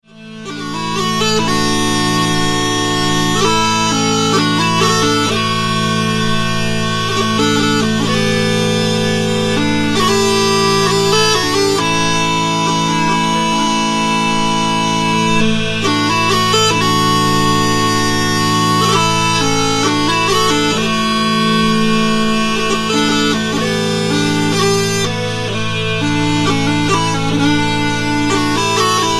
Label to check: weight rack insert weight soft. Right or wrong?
Wrong